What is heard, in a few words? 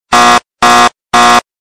Loud Alarm